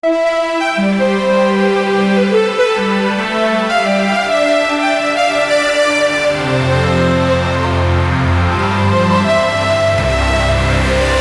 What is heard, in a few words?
gongs